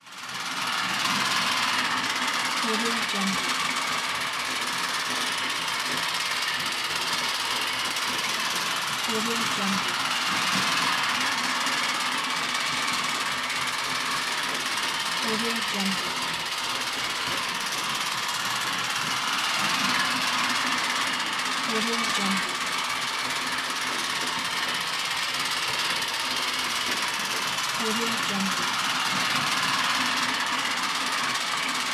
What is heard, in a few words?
toy train